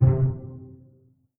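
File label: string pizzicato C2 pianissimo right; Sound On Sound - Peter Siedleczek's Orchestra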